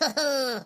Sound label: hoho; jocly